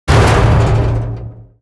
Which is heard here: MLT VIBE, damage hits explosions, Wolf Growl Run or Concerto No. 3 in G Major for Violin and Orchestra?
damage hits explosions